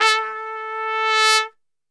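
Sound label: A 2 trumpet; Best Service; Brass Super Section; TRP SWL; 90 s Sample CDs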